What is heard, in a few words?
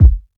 bass drum single hit b key